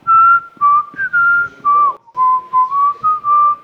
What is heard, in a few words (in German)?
pfiff